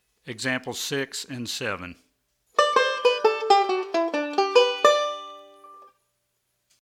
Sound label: Scales And Arpeggios